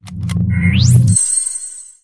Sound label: battery in suit; hl2